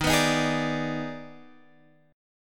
D sharp Major 7th Suspended 4th Sharp 5th D sharp, chord